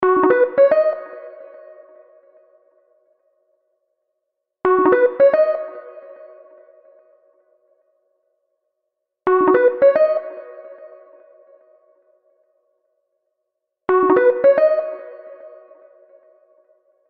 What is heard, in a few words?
Mellow Bells